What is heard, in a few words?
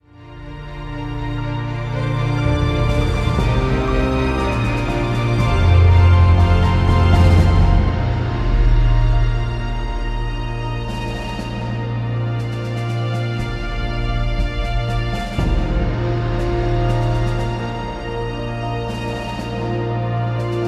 orchestral